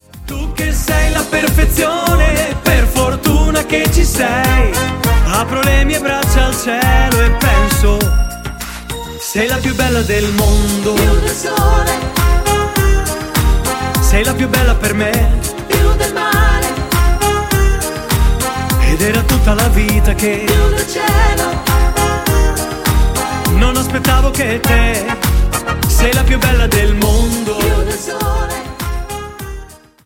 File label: Best cumbia